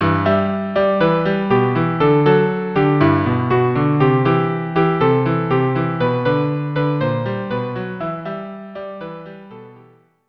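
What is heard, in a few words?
violin cello; Al Hanisim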